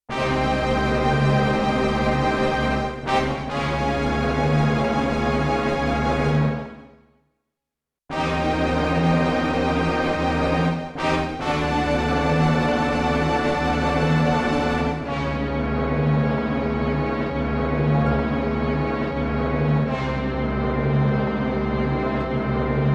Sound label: Orchestra Harp, Yamaha TX16W; Orchestral Performance 2